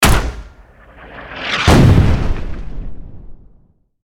Mortar strike launch and impact

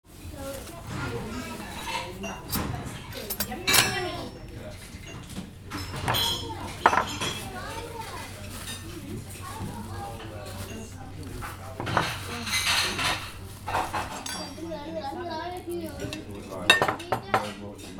Cafe ambience quiet

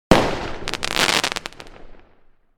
Fireworks single explosion burst and crackle

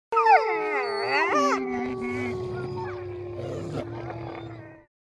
Pack of wolves howling and growling

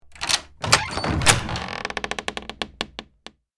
Cinematic door and lock open; household

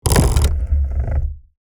Operational industrial gears squeaking

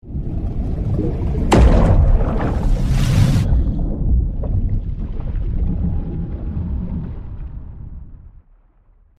Naval underwater missile launch shot; ship

